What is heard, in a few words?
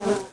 beehive atk hit; 贝亚; 荒野乱斗